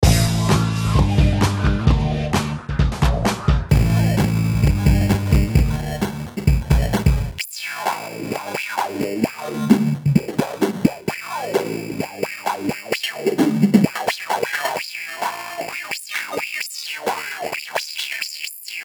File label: crush and filter